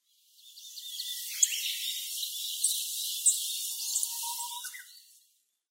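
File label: Yellow-breasted Bunting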